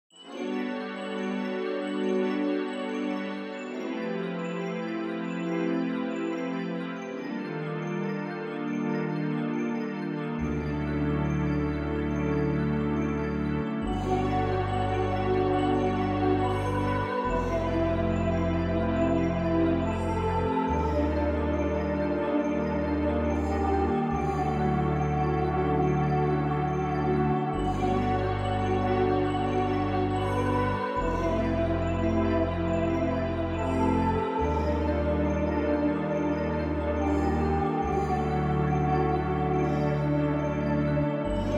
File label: Midi Scene; Shining Plume 2 Windows gamerip 2017